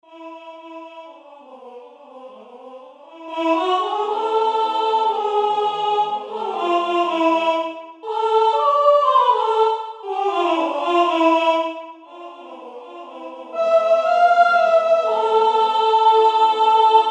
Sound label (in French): Le printemps soprano